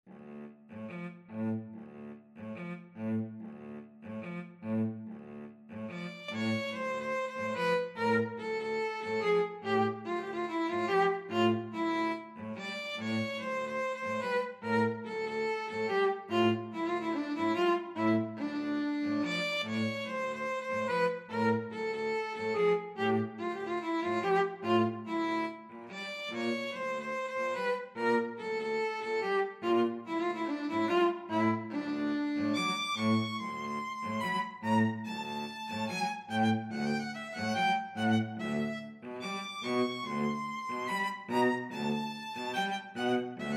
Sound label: violin cello duet